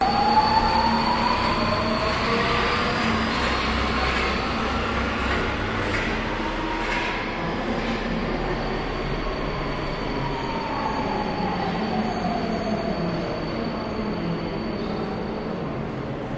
Metrostation Soundscape Metros Leaving Announcements In Distance; Budapest Hungary